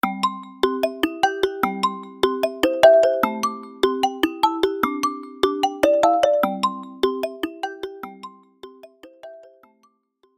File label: Xiaomi Redmi Go; suonerie